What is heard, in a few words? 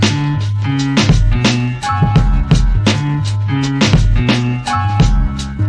test loop; hnsru overpass